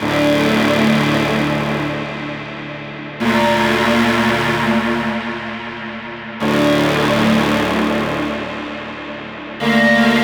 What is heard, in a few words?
Pad Loop 150BPM D